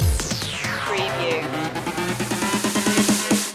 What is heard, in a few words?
loop fill; media music now